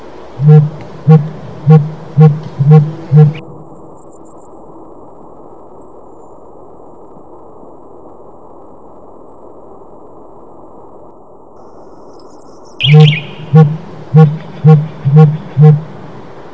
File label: blue grouse